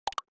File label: staple bops